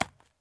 move; GrandFantasia

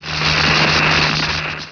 REPAIR VEHICLE SPARKS; Emperor - Battle for Dune

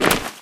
gear, metropolice; Garry's Mod; hl2

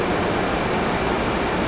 machine hum; ambient; Team Fortress 2

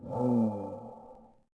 Gasmask Fidgt Vox; Sid Meier's Civilization 4 Complete; Beyond the Sword; Units

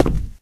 wood, footsteps walk; Left 4 Dead 2

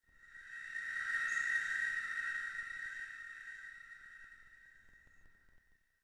Choir Pad; Ambient; Sid Meier's Civilization 4 Complete; Beyond the Sword

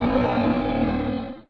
Dread Die; Sid Meier's Civilization 4 Complete; Beyond the Sword; Units

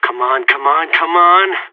scared emote; player vo seal

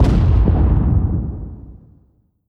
medium armour fire; Hearts of Iron IV Death or Dishonor

